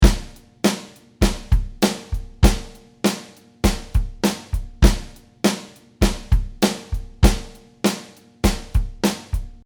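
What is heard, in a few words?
Basic Soul Drumcore, 100 bpm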